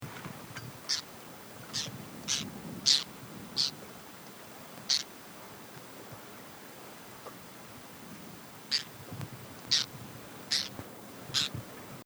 Skaggtarna

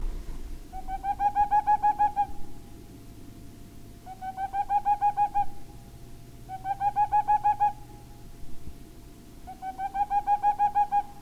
Parluggla

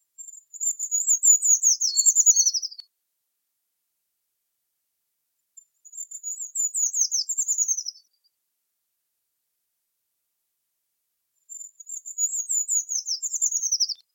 Trädkrypare

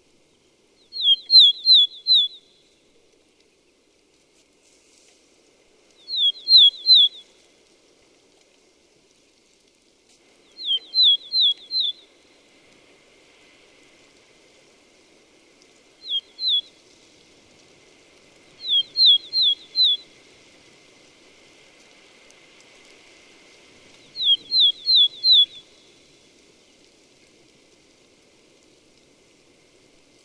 Blastjart; Red-flanked Bluetail; Tarsiger cyanurus; Patrik Åberg

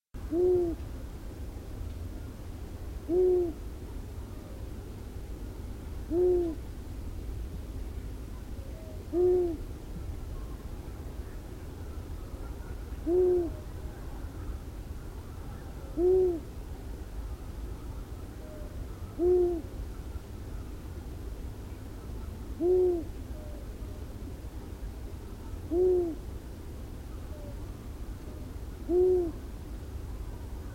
Hornuggla; Long-eared Owl; Asio otus; Tomas Belka

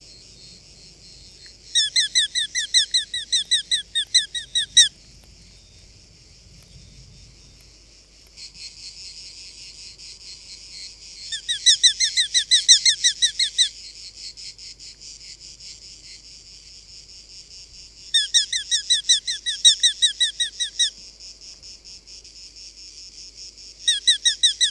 Lärkfalk

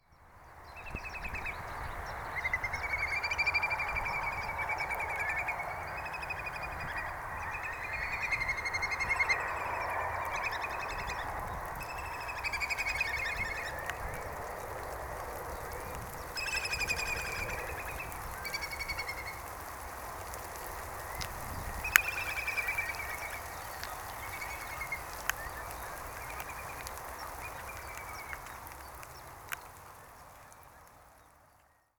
Smaspov

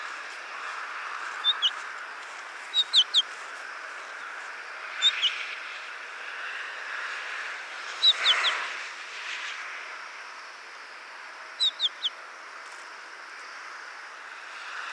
Tallbit

